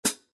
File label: hihat; drum pad